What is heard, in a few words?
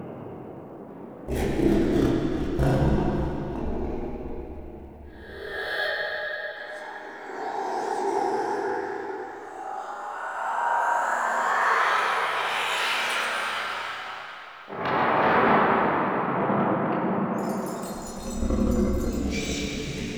Vendor Buff Menu Bed Loop; Tau; Circles Of Hell